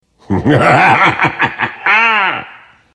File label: laugh funny ringtone; sand king